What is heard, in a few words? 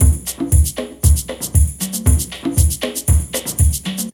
break; Zero G - Man Machine